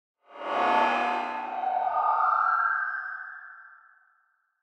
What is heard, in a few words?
Metallic Whoosh Ascend Rear To Front; Metallic Sound; Movement Production Element Imaging Element Accent Transition; Blastwave